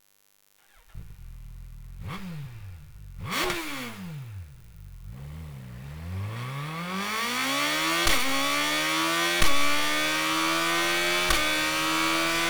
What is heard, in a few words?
Akrapovic Slip On GSX R1300